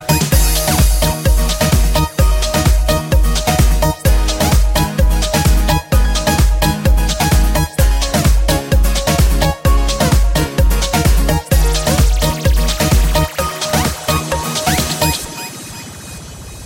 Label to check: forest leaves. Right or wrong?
Wrong